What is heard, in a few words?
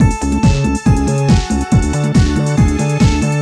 loop